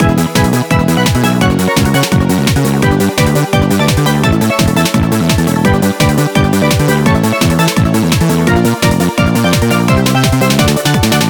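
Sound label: background music fever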